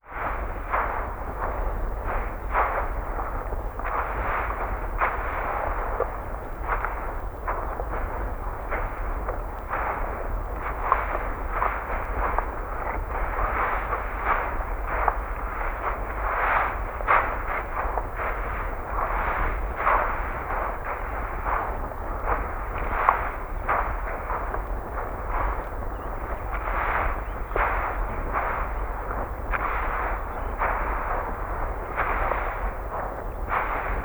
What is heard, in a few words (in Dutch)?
hooikeren met vork